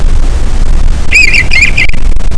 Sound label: bluebird; Ebluebird